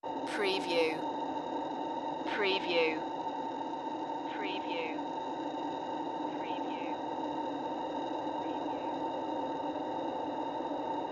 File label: Thrilling Breath